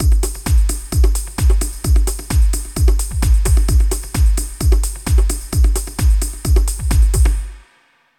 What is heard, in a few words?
Drum loop